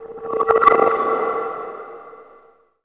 SKIMMER LO, PERC; NorthStar - The Wizard VOL-2; TSAR PERC

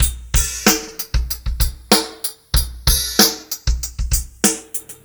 R&B Drums

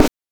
GHOST SD; NES DPCM; MIDINES

